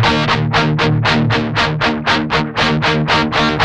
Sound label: 8TH E 2, Guitars; Spectrasonics; Hans Zimmer Guitars VOL-1; 128-JACKHAMR